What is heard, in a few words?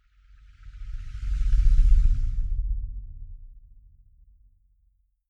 magic spell summon stream